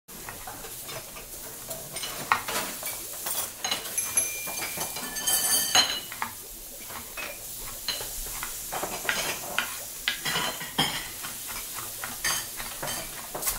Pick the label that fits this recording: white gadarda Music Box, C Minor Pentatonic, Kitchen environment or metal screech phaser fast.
Kitchen environment